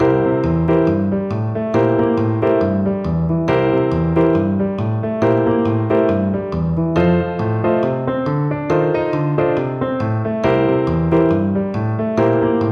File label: rock, piano